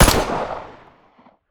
fiveseven; arccw go

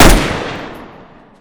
bizon; arccw go

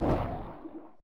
spore launcher fire distant; hl1 weapon

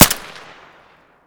ak74 suppressed; arccw go

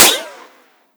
ricochet; arccw

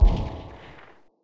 desert eagle fire distant; hl1 weapon

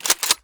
sawed off pump; arccw go

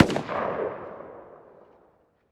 m4a1 distant; arccw go

